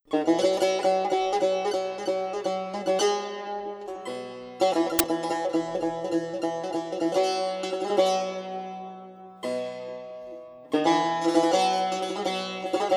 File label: Saz